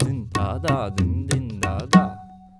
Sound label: India, Heart of Asia